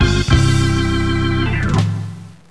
tadah; Comedians - The People Behind the Laughter

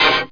explosn

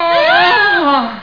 ohh

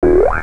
phased, electronic sounds; Sound Effects for Multimedia - Volume 1

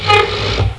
chair; Little Red Riding Hood

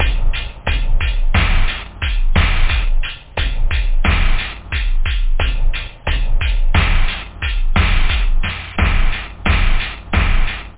basic, loop, house; da capo vol1; instruments

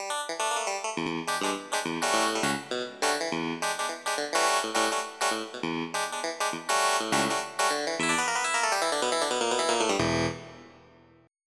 Clavinet

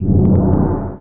map go click; The Lord of the Rings The Two Towers CD Cardz Warriors

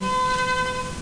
Flute B; Sound Library 2000; Wayzata Technology

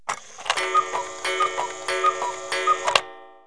CUCKOO; KABOOM! - Wild and Wacky Sound Software; house; chimes

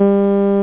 ring; da capo vol1; instruments

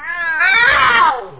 JAGUAR; Aminet; ChaosHeavy

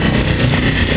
Shield

